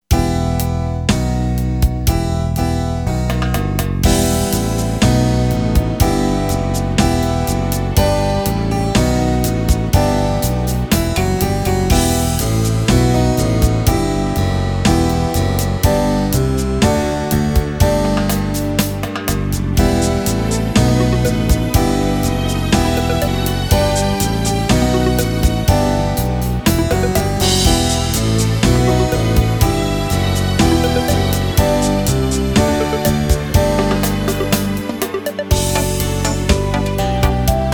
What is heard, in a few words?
Flute Bourree to play along